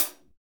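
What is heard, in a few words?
HH, hi-hat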